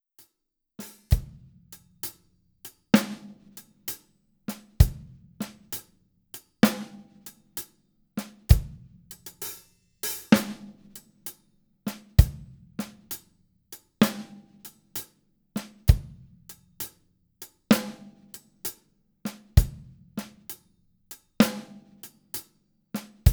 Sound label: half time shuffle